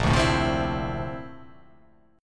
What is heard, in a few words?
Bb Major Sharp 11th Bb down, chord; strummed; JGuitar